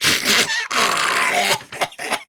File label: Laugh Grineer Ghoul Sargeant; Grineer Ghoul Drill Sargeant; Battle Barks